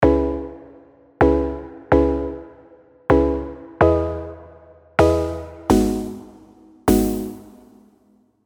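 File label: Serum, keys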